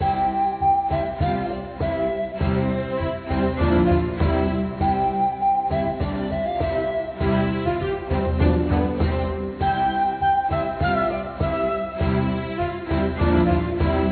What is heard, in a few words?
Romantic; Castle Clash Heroes of the Empire; Android